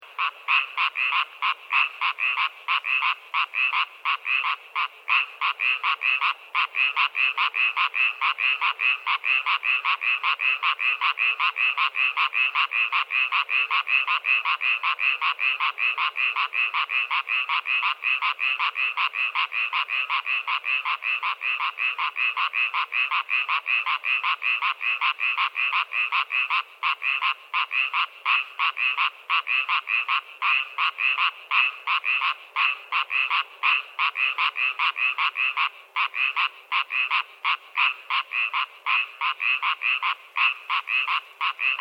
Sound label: Animal Frogs Unused Swimming Pool Distant Loop; Animal Frogs 2; frogs recorded in different locations over time